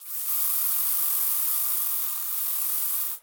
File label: Spraying of spray